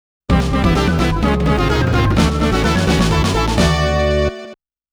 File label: Bonus Round Start MUSIC; Namco Classic Collection Vol.2; arcade soundtrack